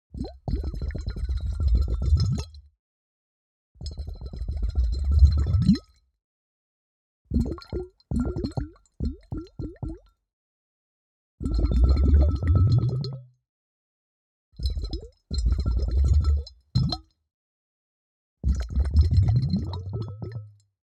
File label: Bubbles Long Steady Underwater Sequenz